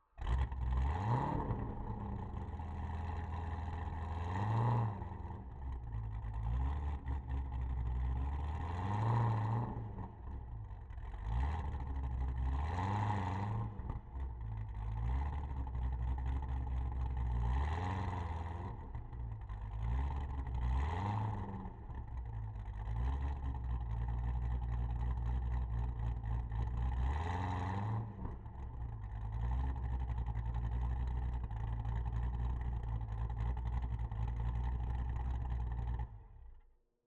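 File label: Corvette C6 Onboard Start Idle Blips Off Exhaust Left; Corvette C6 Z06 2009; Pole Position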